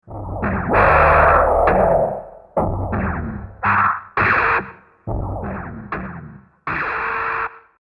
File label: Robotic Refinery, science fiction sound effect; Robot Sound Effects